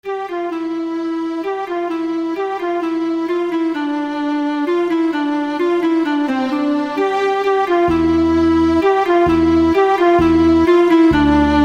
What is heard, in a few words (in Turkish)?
Klarnet